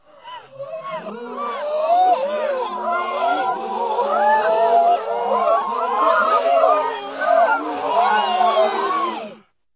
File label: evil gasping ghouls; UFOs Space and Science Fiction